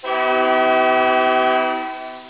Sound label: Airhorn